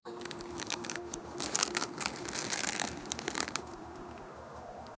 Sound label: chip crunching sound crin; crinkle